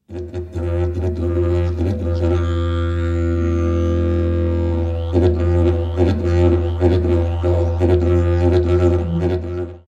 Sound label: aerophone